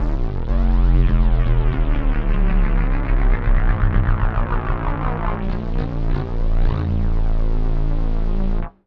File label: Robot; Sonic Foundry (Sony Creative Software); Underground UK House & Electro